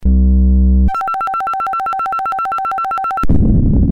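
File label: ton vibro, tone vibro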